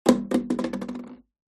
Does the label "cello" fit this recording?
No